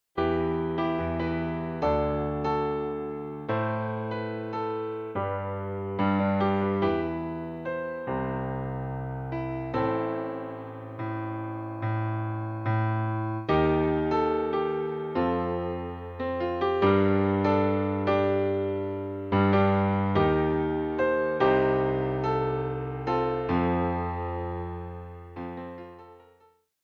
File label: Bad Transition